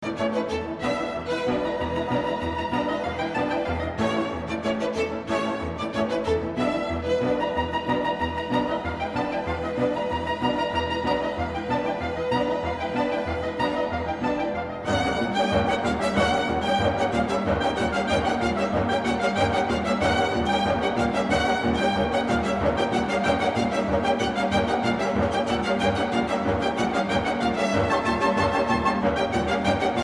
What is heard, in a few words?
Polka Allegro; Little Requiem For A Polka Op. 66